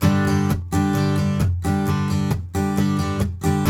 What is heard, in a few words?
Blues Guitar Samples, RIFF; ILIO; Fretworks